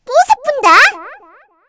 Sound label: DASH